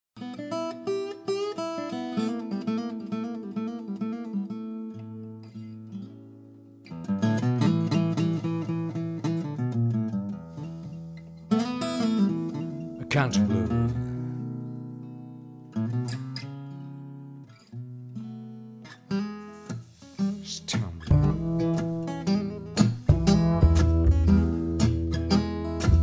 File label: stump blues